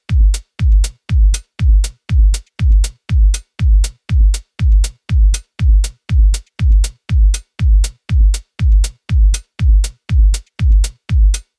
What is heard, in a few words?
kick loop wet more sustain